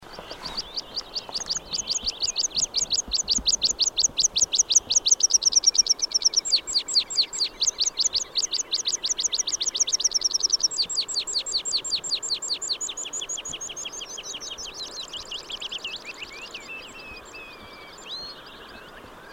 Anthus pratensis; 20.6.2006 Koshim - joki, Intan eteläpuolella, Komi, Venäjä; Laulua. / Komi, Russia. Song.

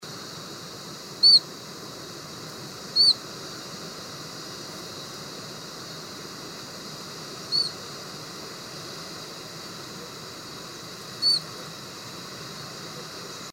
Phylloscopus tristis, Chiffchaff calls; 2.12.2007 Kosi River, Uttarakhand, India (tristis); Linnut

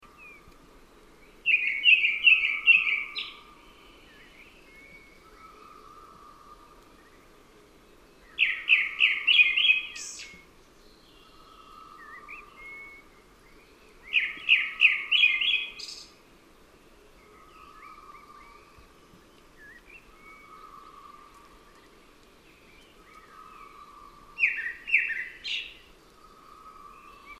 Turhor; Turdus hortulorum; May 2002 Lao Ling, near Qinghuangdao, Hebei, China.; Linnut

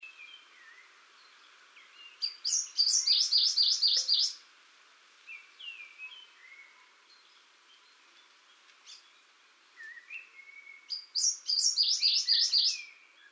Phylloscopus; Blyth's Leaf Warbler Phylloscopus reguloides; Song, 21.5.2002 Laoling, Hebei, China; Linnut

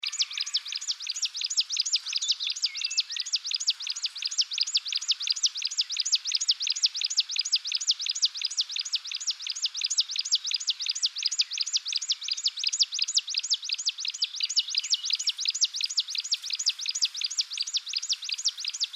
Phylloscopus; Chinese Leaf Warbler Phylloscopus yunnanensis; Song, 21.5.2002 Laoling, Hebei, China; Linnut

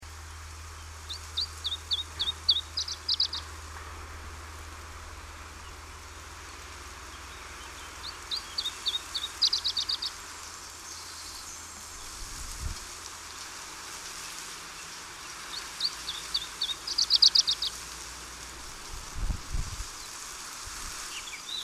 Phylloscopus ibericus, Laulua, Porto De Mos; Portugali; Iberiantiltalttiäänitteitä; 5.5.2000 Porto De Mos Portugali

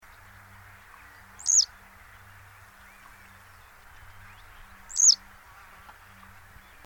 Motfla, Bulgaria, Motacilla feldegg

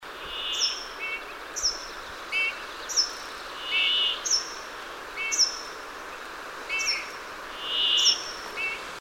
Pyrpyr; 19 June 2004 Yugid Va; Linnut

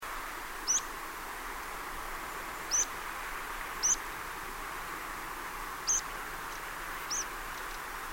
Luotokirvinen / Rock Pipit (Anthus petrosus); Lemland, Lågskär; lentoääni / Flight call

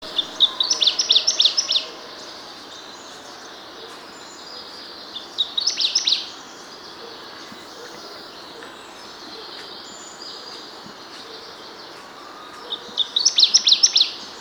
Seicercus, Kunming; 1. Song; Yunnan, China; Linnut; Some Seicercus Warblers in Yunnan, China

